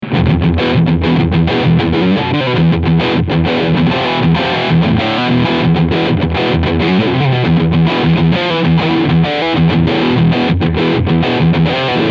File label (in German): Heritage Guitar H 150 Riff Sound Steg Pickup